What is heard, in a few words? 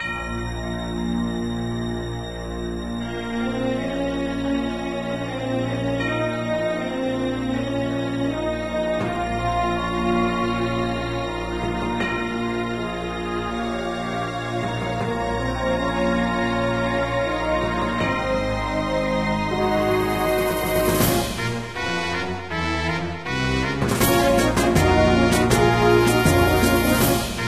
dungeon; MapleShrine